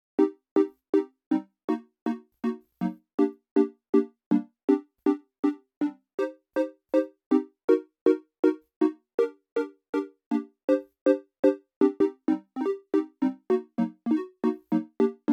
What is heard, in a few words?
Chords; chippy